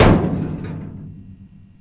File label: Ray Hit